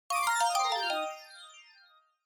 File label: Scene Change Down